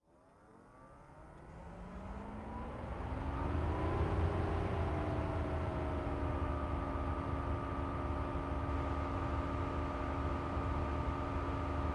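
kia niro hybrid onboard steady rpms electric drive stop x2 engine mix; Sonniss GDC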